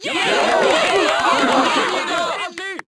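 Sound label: big burst, Crowd German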